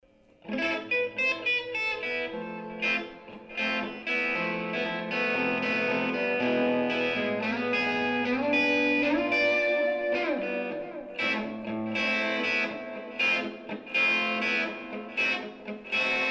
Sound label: latin jam; original songs; RiverMarketArtCo